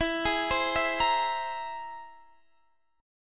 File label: C Augmented 7th E